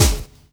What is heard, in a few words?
KIT, Dance Mega Drums; Best Service